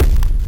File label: curved step